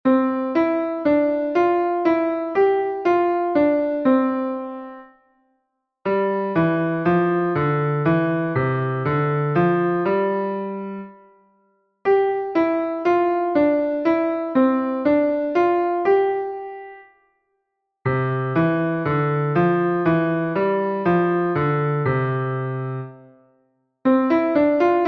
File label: Building the Hands Alternating Finger Pattens